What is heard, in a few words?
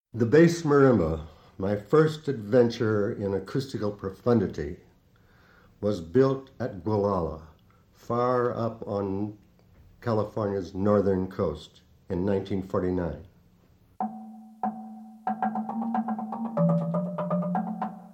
Bass Marimba